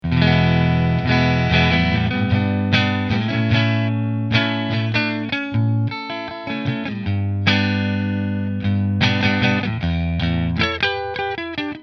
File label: Nola Voodoo Neck Fender, guitars